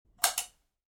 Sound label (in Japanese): 紐付きの照明のスイッチ音